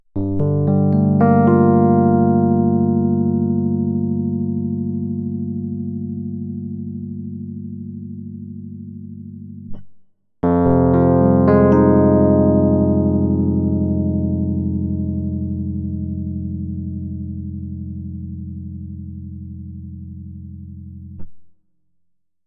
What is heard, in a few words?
Rhodes Sus